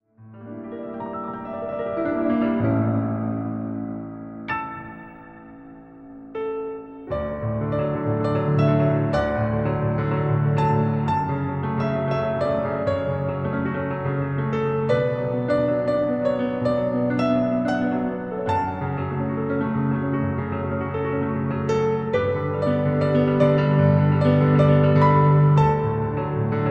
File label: Piano